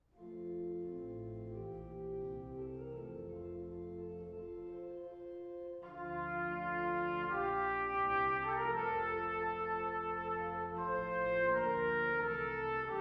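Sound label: where'er you walk trumpet and organ; weddings; handel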